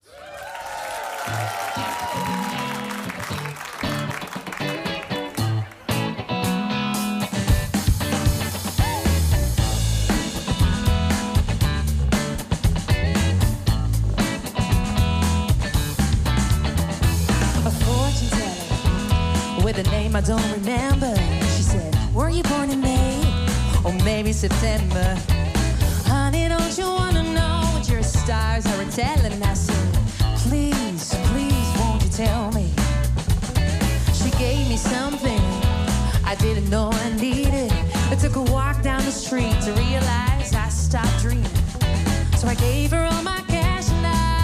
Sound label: In session, Music Cafe; NPO Radio 2; AVROTROS